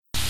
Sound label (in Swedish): extrem hit jingel